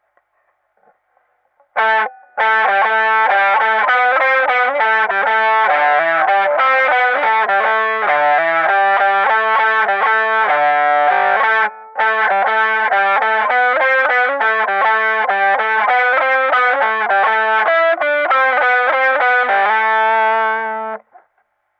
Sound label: electric guitar